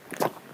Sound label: Stony Step, outdoor, Footsteps